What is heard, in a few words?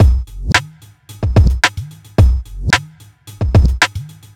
r n b kit 110